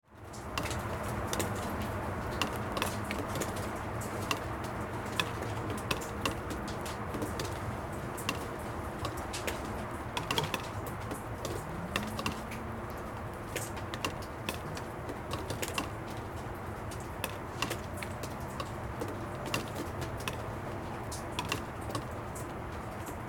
Water Dripping On Plastic Distant Traffic; 2496SoundEffects; Rain in the City

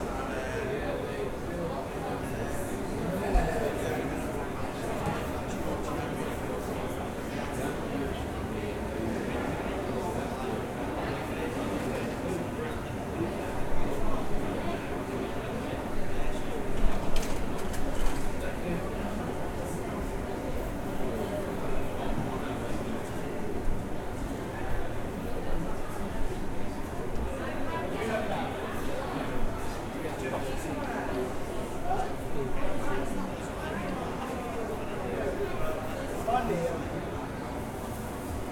Athens. Monasthraki Area. Restaurants Area; George Karagioules; The Source. Ambiences from Athens

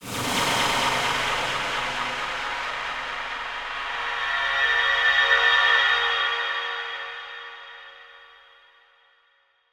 Movement Feedback, DISTORTED PERCEPTION

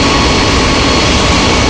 wind blow loop; hl2; combine gunship

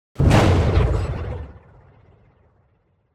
Heavy Magical Explosion; Sci-Fi; Mechanical Wave; Sci-Fi-Invaders

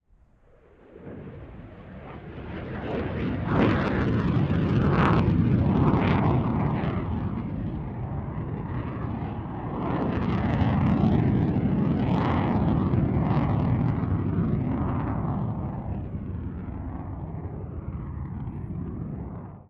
Jet, Fighter, F-22, Raptor, By, Slow, Crackle

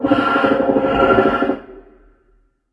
search, antlion guard far; hl2

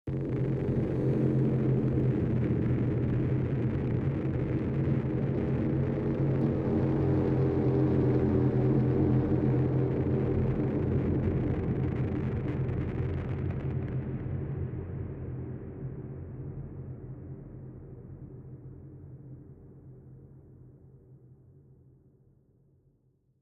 Tension - warbley burning tone; SoundMorph

